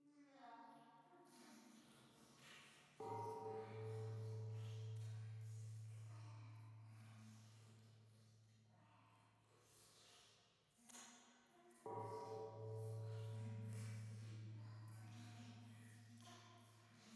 夢の中 フェラチオ 神社

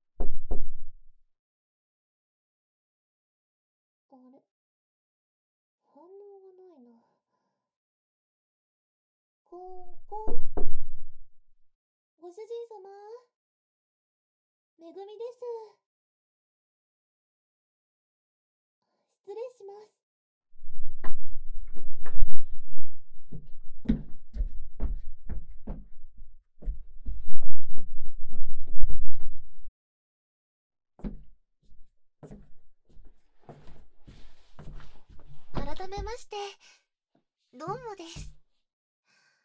頭皮マッサージ, ASMR

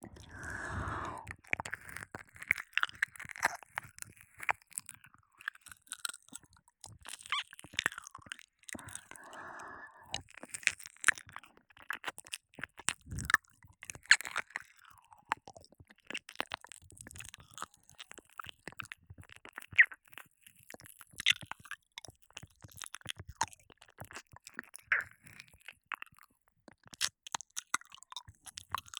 耳なめループ 右, 水音なし; おまけ.耳なめループ（右）

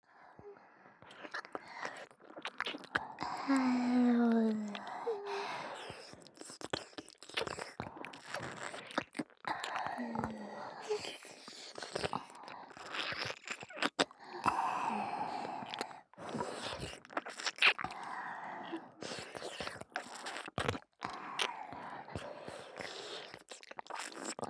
両首筋舐めループ

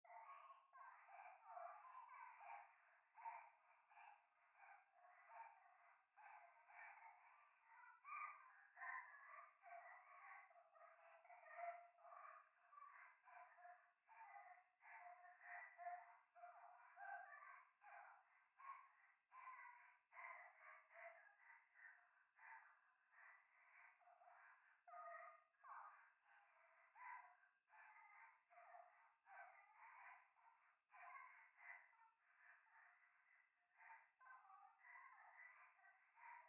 夢の中 可愛い喘ぎ 山奥夜

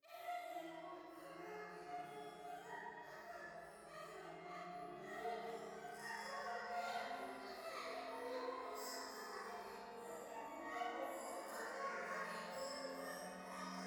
夢の中 喘ぎ 讃美歌

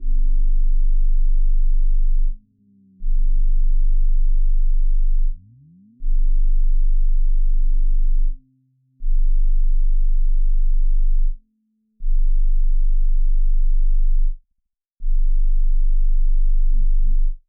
「25Hzガイド付き」～30Hz; 性依存中毒症

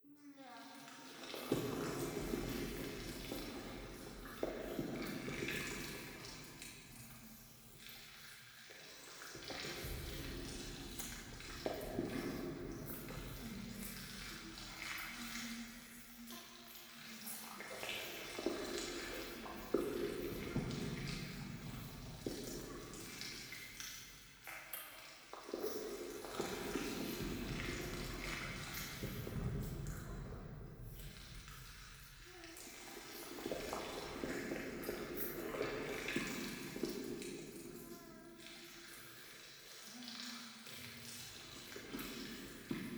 風呂 フェラチオ 触手

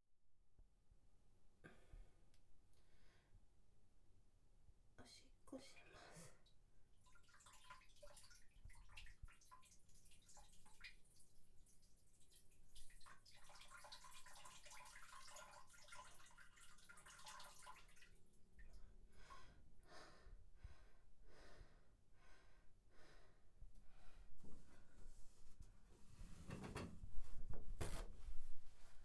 おトイレで! オシッコですッ!

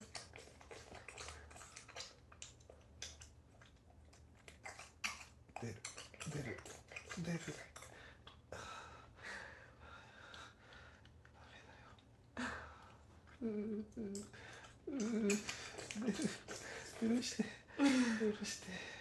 フェラ; 2 フェラ～おちんちん遊び

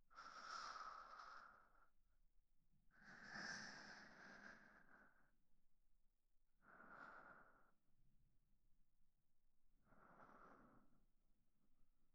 寝息; SEなし

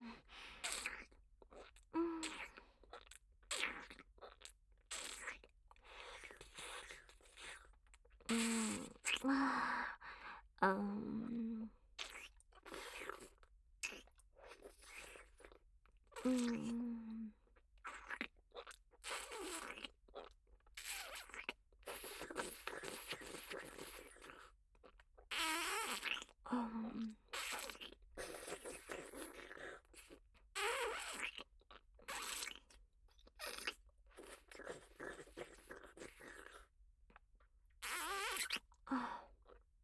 フェラ

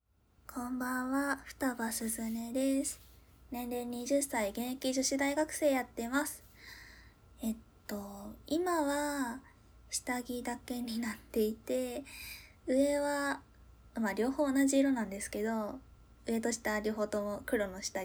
フェラ音, ASMR

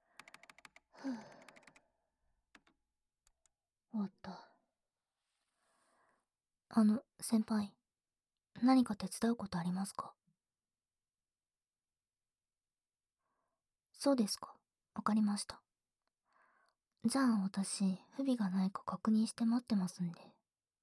耳を舐められながら, ささやき, ASMR; ささやき乳首焦らし

